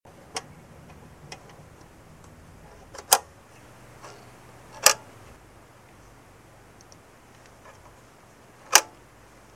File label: box